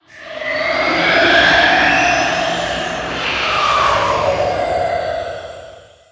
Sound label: beast ghost rise